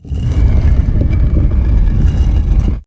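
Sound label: DOOR open; thief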